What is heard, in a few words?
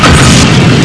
chevron offworld, stargate